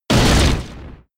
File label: Hammer; Wood Hammer